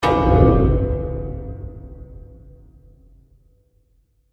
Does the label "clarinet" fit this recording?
No